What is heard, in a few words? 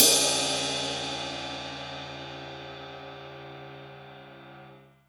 Hanging Ride; Drum Hits; Crashes; Nu Dance Electro; Zero-G; Soundclash